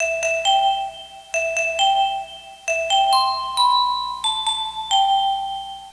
Clock Sleep reward; Fisher Price Ready for School Kindergarten

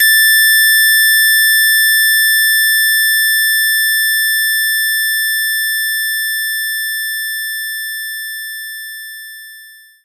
Harpsichord Bb4; knights try

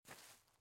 SFX step grass

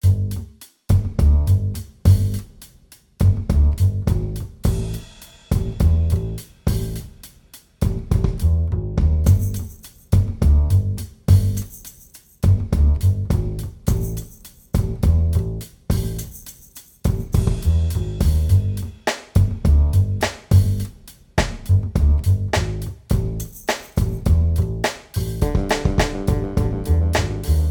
Beat; Peggle Beat 09

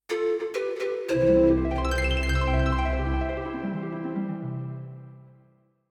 Level End; Sprill The Mystery of the Bermuda Triangle

